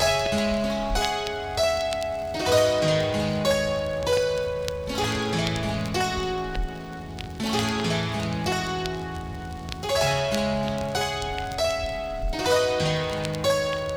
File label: Chords, bpm 96; hiphop; StudioLinkedVST Vinyl Touch; Progression 01